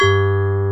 VIBES BELLS

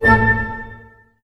STAC A PP, orch stac; Sound On Sound - Peter Siedleczek's Orchestra

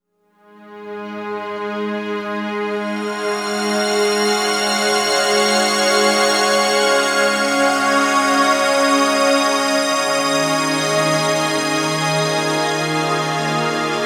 Long Hi Complex, Complex Wave; Sonic Foundry; Sony Creative Software; James Johnson Spektral Minimalism

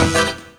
stab; E Lab; XTc Files of House

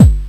Kick 7999Hz; Tech; Sonic3; Genesis

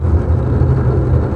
TREM STRINGS

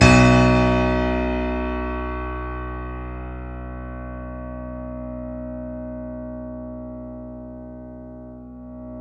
KEY, YC7 Piano ff; Roland L-CD701; ff YC7 St

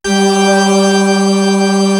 01W CHURCH; East West; Global Sounds

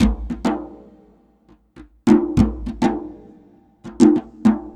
Djembe; Sonic Foundry (Sony Creative Software); Ambient Atmospheres & Rhythms

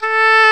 oboe, Orchestral Winds; Roland LCDP04 Orchestral Winds; Oboe 4-8; Oboe f 5

